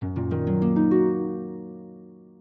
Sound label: harp; lottharp; lottblocks